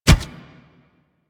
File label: Kubrow Run Metal, Footsteps; Warframe